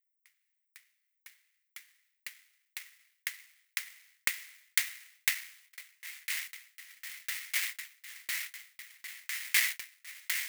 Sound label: mirror music